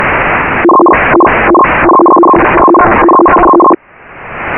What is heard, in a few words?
DCS USB